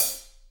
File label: HH, Roland, Real Kit; 90 s Sample CDs; Sound & Vision - Gigapack I CD 1; Real-Kit s 4